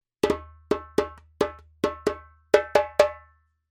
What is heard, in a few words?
djembe; djembebog oevelse